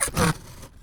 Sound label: Monster Noise; BB Retail Therapy Sample Pack